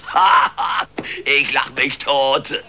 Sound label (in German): lacht sich tot; alf